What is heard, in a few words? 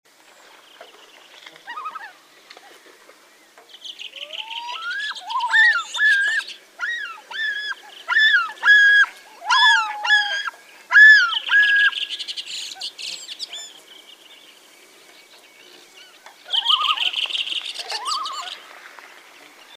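common loon voice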